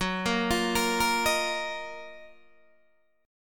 F sharp Major 13th, Guitar, Open D; 16,13,15,16,13,13

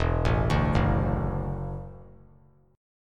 E 7th Suspended 2nd Suspended 4th, down; E7sus2sus4; arpeggiated

